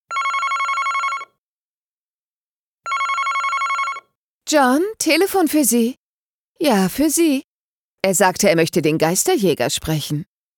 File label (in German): klingelton